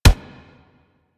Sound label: Weapon Punch Rifle; Warframe; Weapons; Rifles